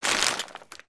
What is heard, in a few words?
paper RUSTLE